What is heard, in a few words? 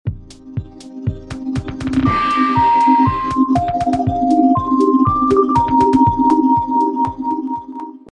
Carillon